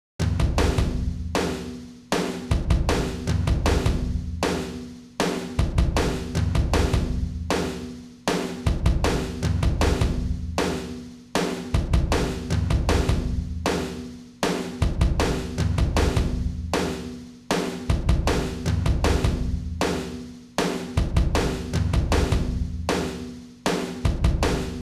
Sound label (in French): batterie